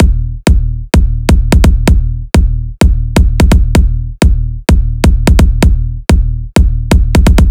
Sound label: Big Room Kick Loop A